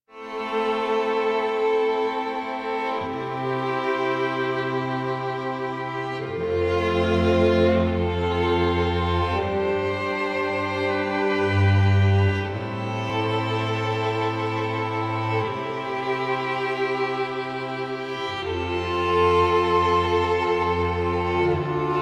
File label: Envy Cue; Warframe; Duviri; Duviri Paradox Quest; Duviri Diorama